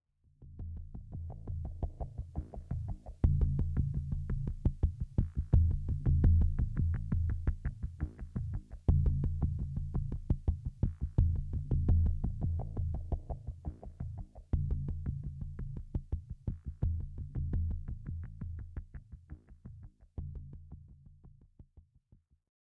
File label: 85bpm